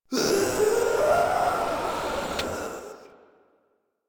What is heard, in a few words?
Proto Infested death; Warframe; InfestedNinetyNine